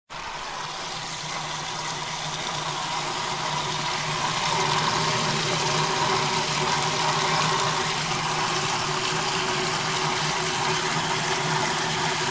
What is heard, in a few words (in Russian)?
zvuk stiralnoj mashiny